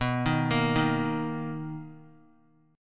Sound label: B Major 7th B down; arpeggiated; JGuitar